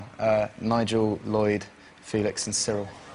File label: Guitars